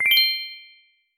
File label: notify small; pond5